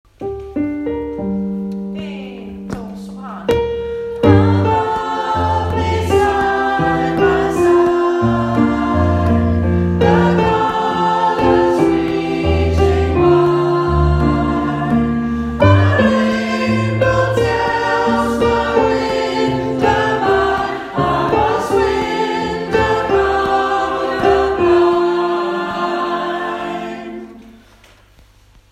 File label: Hymn SOPRAN